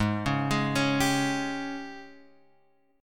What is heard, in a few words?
Ab Major 7th Suspended 4th Ab down; arpeggiated; JGuitar